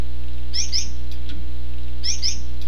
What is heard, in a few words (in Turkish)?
gould dişi